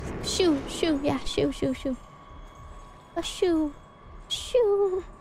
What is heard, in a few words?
shoo